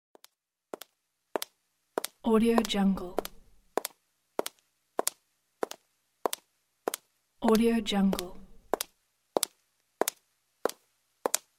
marble heels steps

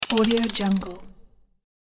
cartoon choppy rising effect

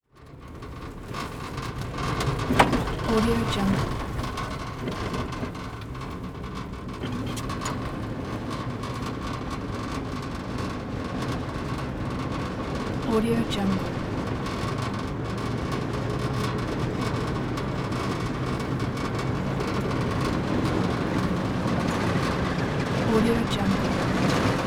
volkswagen baja bug rolls down gravel hill

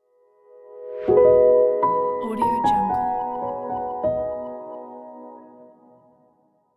light piano logo

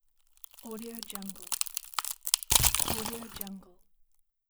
tension crack and break

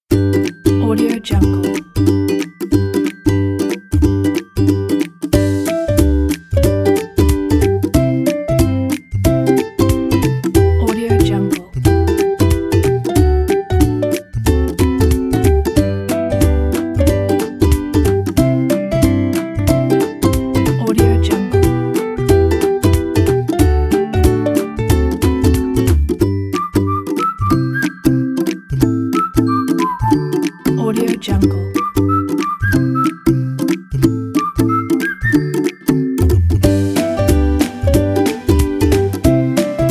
the happy

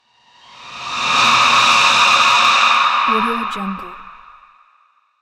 bloody nightmare psychological; soundmorph

